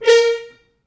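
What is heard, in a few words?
French Horns stops, Quantum Leap Horns Sax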